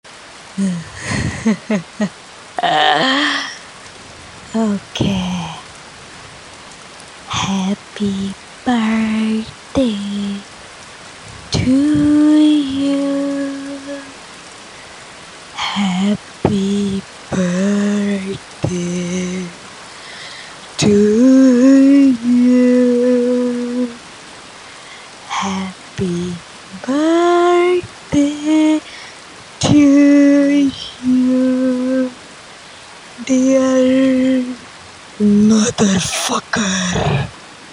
creepy girl singing happy birthday scary horror voice